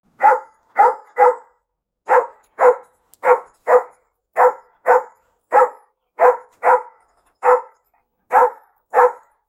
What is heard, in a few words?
realistic single dog bark